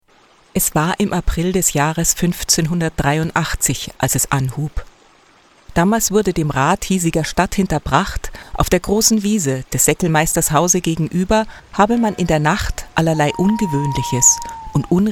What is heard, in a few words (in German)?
Teufeleien mit Musik